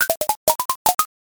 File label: blocks collapse